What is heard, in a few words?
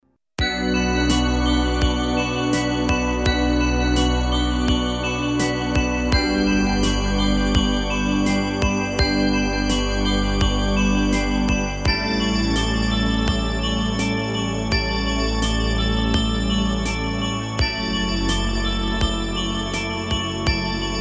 Pads